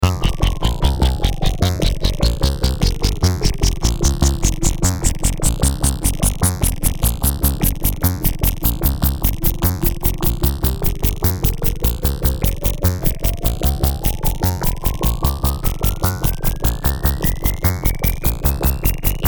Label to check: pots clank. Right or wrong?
Wrong